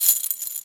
Hookshot; Firing the Hookshot (Link's grab)

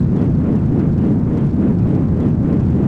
Pulsate; Barinade; Zelda; OOT

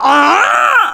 Bloat; Oren bloating; zelda

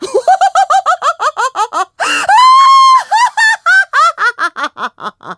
Laugh Ascending; The Lady laughing while descending and re-ascending (changing to her 3rd form)

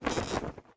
Arrow Pull; Pull back an arrow (Recorded)

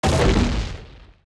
Box Blow; zelda; OOT

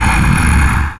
Snore; Ganondorf snoring

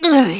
Die; Link; zelda

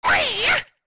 Shoot; Wizrobe shooting fire

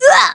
Hurt; Sheik

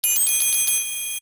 Alarm; Bonus sound: Tatl and Tael alarming in stereo

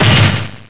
Bound Chest Drop; The bound chest (holding the Picori Blade) being dropped

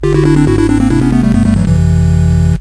Game Over; "Game Over" jingle

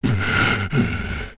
Breathe; Ganondorf

